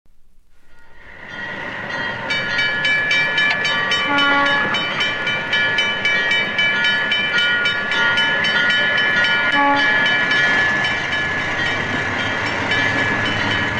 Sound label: Railroad Crossing